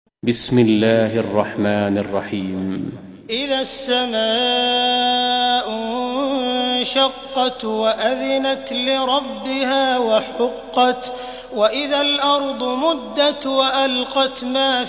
insikak, kuran; sudeysi sureler

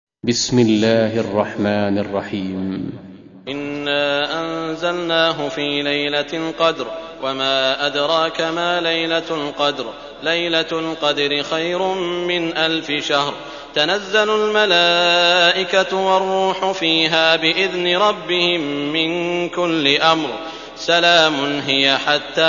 Kadir, kuran; sudeysi sureler

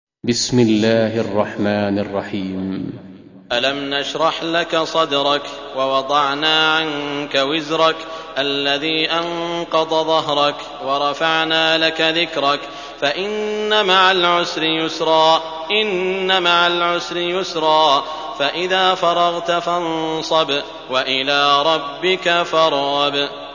insirah, kuran; sudeysi sureler

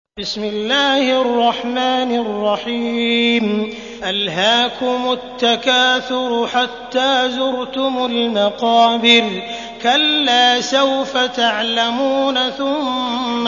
Tekasur, kuran; sudeysi sureler

